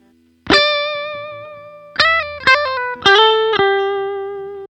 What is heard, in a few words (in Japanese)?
JC単音弾き